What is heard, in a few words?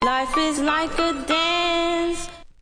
life is like a dance, female singing; VOCALS and SPOKEN WORD